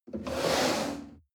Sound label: chair wood slide on floor hard